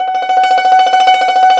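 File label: YANGCHIN tremolo; INIS - Opium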